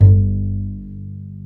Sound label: Bass, Roland; Club-50 - Foundations Roland; Ac Bass